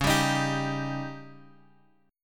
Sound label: C sharp Suspended 2nd Flat 5th C sharp, chord; C#sus2b5; {X 4 5 X 4 3} strummed